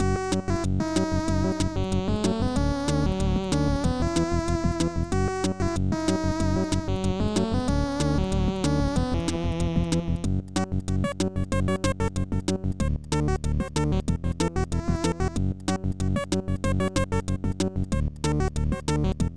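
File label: keygen song.xm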